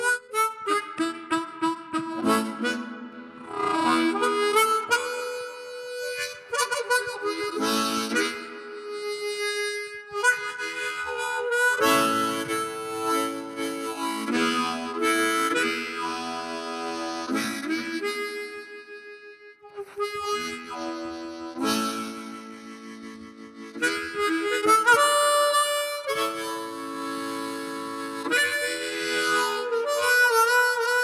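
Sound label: Harmonica with reverb; Clint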